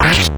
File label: enemy hit; earthbound